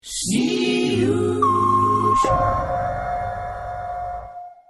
snee oosh

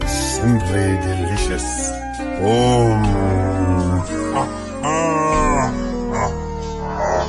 simply delicious descending mono

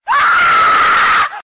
girl scream

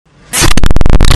audio glitch

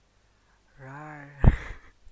roar

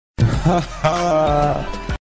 autotune haha; chris smoove

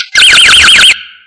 buzzer

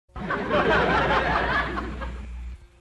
80s laughter

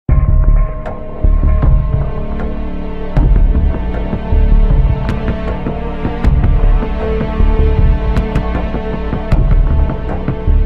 multiplayer menu soundtrack

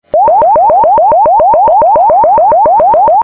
mass casualty alert

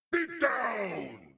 beat down; sunset overdrive

